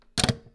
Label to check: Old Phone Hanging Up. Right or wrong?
Right